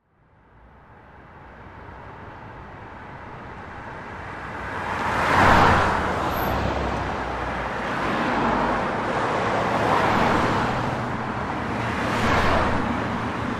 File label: street traffic